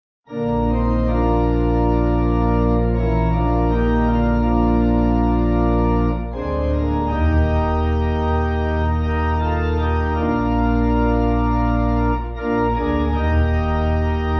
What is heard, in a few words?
Pipe